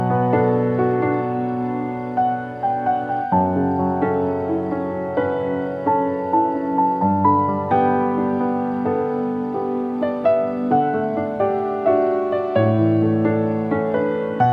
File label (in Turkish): Pyano; Kiraz Mevsimi